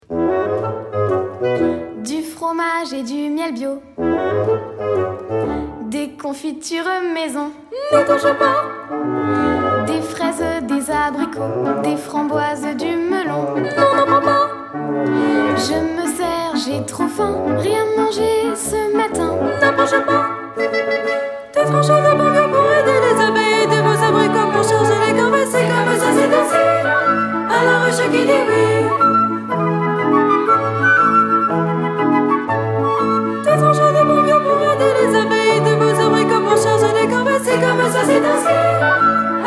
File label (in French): Chanson de la Ruche qui dit oui